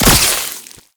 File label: Flechette Proj Explosion; Warframe; Tenno; Oraxia